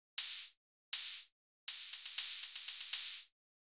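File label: Hat Op Dirty, open hat; hydrogen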